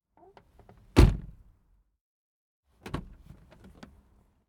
Toyota Camry Door Close Inside

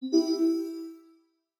Jingle

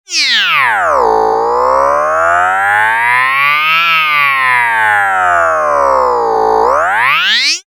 Boingy Sweep

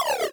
Retro Zap

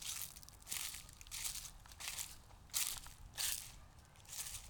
Footsteps Leaves

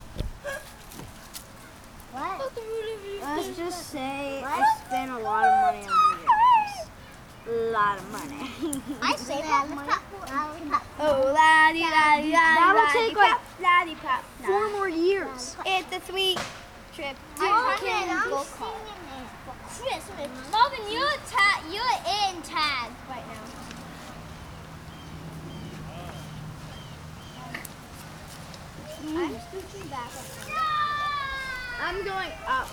Kids Playing

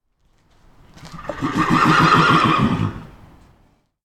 Redbarn Horse Whinny

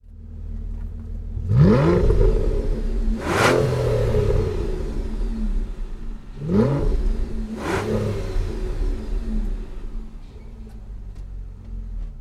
Aston Pull Away

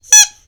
Squeak Duck

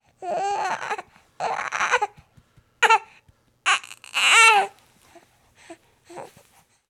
Baby cry

